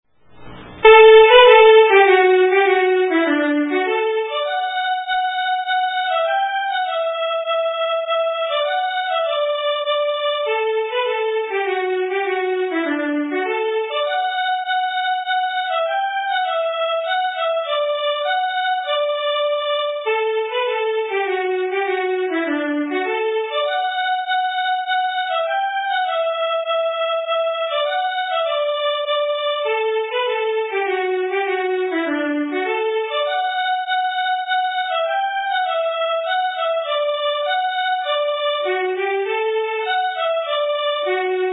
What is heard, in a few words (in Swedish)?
Schottis